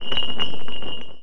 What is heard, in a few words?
Chains; Playable Tutorial Level